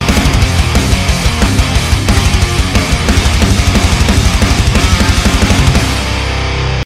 outtro music win; the myst scout

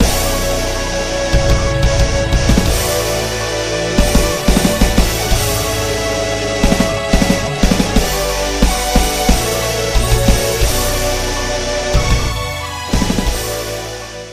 intro music; freak fortress 2

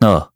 stabbed; ff2; havoc blackcell

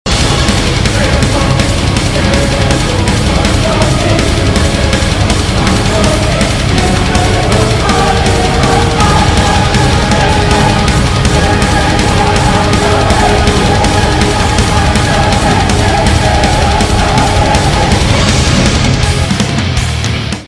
intro music lunatic; true admin

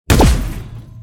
flicker; florentino

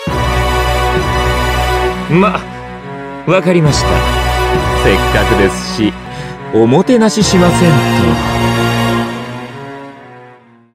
intro music; hametsu

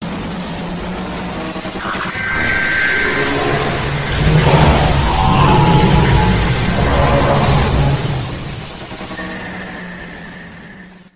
siren warning; ff2; siren head

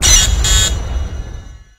airstrike; commandoscout

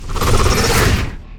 rendezvous teleport; undergroundproject

